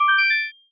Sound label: small win chime